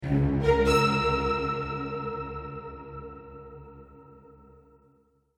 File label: Orchestra Horror Sting; Stinger